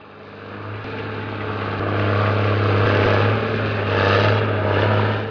suara mobil

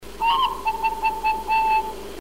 suara burung perkutut

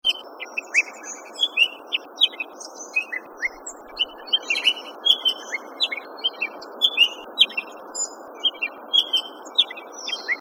cucak kerinci

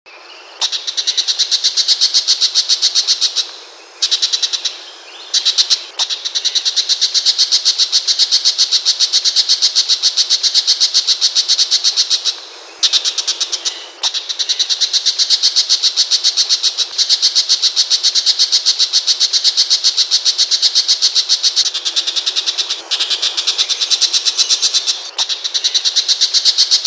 cendet loreng macan

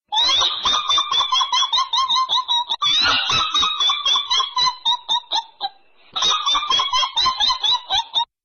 master kuntilanak

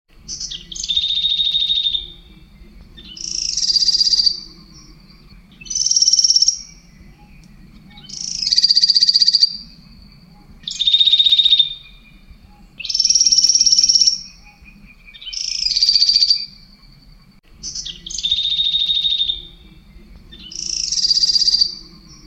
prenjak betina mountain tailorbird

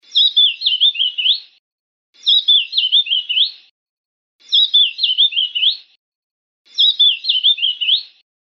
suara pleci dahi topi hitam

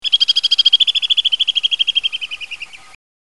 suara burung alpine swift aseli

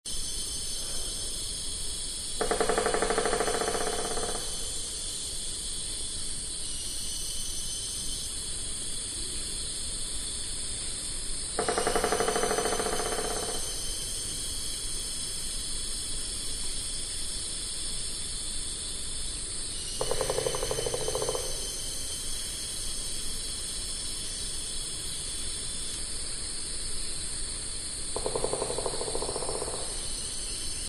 suara drumming pelatuk ayam

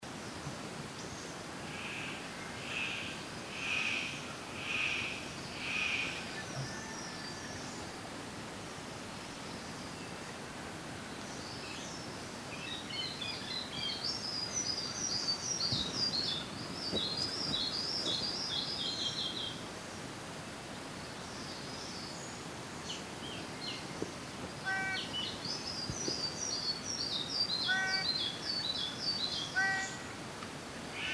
suara cenderawasih biru paradisaea rudolphi

